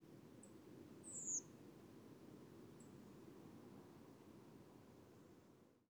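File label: Redwing Turdus iliacus, A typical NFC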